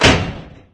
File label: forklift stop; ambient; source engine; ep2; outland